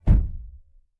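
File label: sedan trunk slam; trunks & hoods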